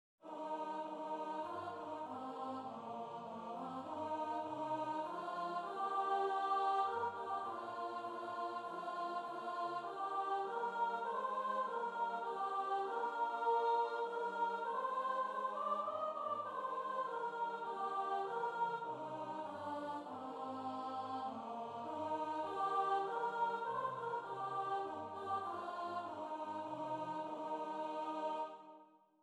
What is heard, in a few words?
lord of all hope sops, choir